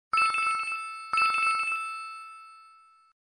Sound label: Telephone Ring, LG UX220